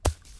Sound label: grass; Mafie 1